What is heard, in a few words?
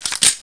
beretta in